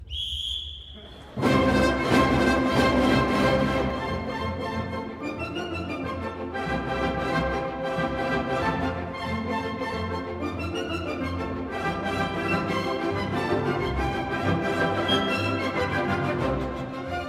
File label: Sport Polka